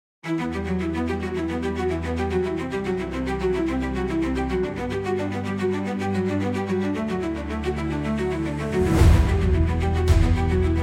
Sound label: Synthwave